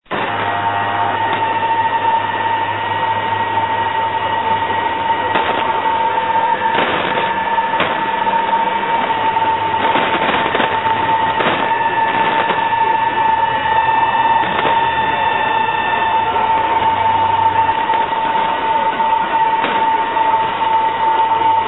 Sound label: prs usb